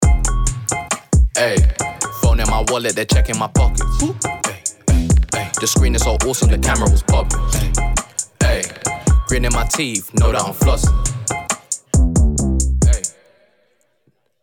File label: HIP HOP; SAMSUNG; NO VO; samsung dance awesome challenge